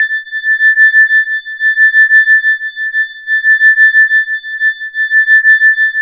E-HARP, DX HARP; Wizoo - Powered DX

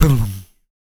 PERC, MALE PERC

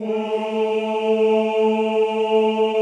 CHO A2, Ooh

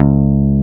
BASS C#, AC BASS, AKAI S6000; AKAI S6000 CD-ROM - Volume 1

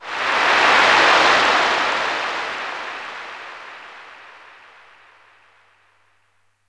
Water, Roland JX-305

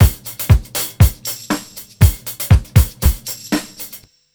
EASY BEAT, Roots Disco; USB Soundscan vol.32; AKAI

